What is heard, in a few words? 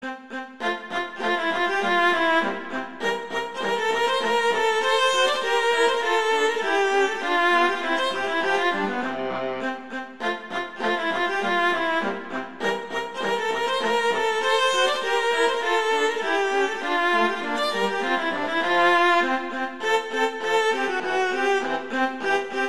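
Duet, Rondo Allegretto, viola; Mazas; Duets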